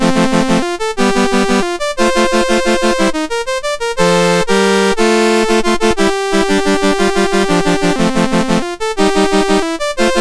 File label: sid MOS 6581R4